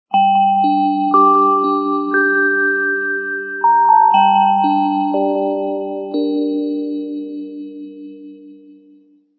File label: Church Bells, alarms; Lenovo S938